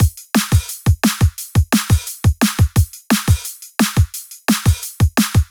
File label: 174, drum loop, funk; bomb